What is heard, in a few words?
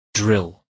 drill